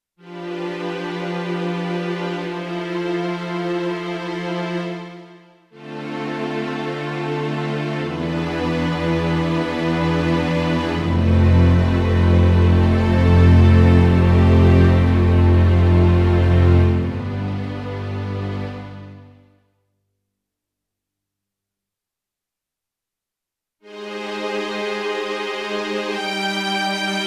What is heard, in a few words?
HP507 Strings